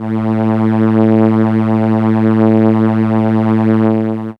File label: Cello A2, ARP Solina; musicradar-synth-samples